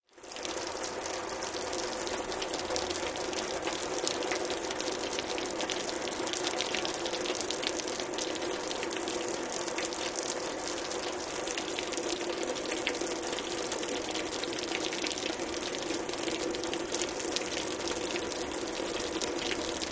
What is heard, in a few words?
Rain Fall On Metal Stee